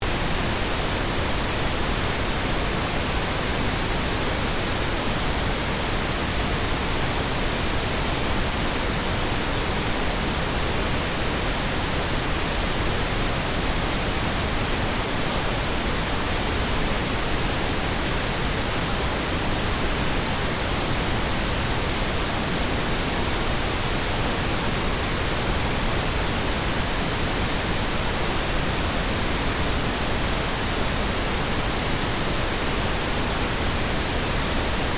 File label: pink noise